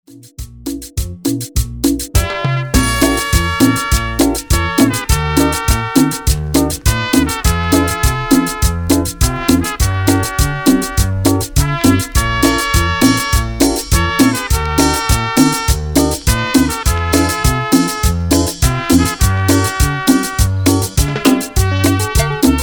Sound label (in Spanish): Intro Cumbia Mexico, Bpm 102